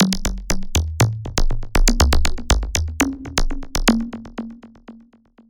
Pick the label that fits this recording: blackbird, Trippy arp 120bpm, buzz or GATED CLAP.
Trippy arp 120bpm